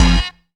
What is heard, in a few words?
ALTO STAB; Zero-G; Funky Elements